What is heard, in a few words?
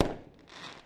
fireworks